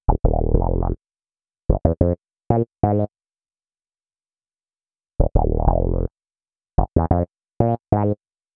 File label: Melody, Hip-Hop